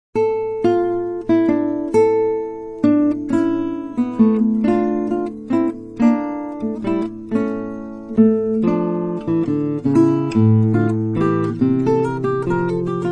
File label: visee gigue, guitar; Suite